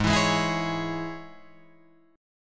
G sharp Suspended 4th Sharp 5th G sharp, Guitar Drop D